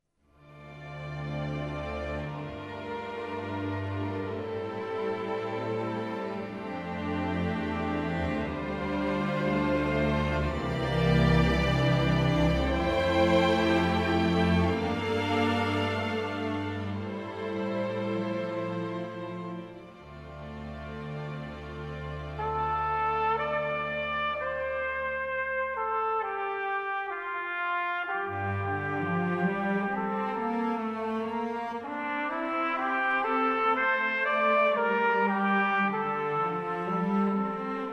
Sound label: Trumpet Concerto; Ross; Mvmt2